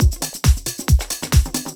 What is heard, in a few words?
Techno, 4-Floor Breaks Kit